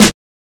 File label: reverb steel snare drum g sharp key, Royality free acoustic snare tuned to the G# note.